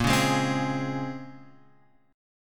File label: A sharp Minor 9th, A sharp; A#m9 Chord {6 4 6 5 X 4} strummed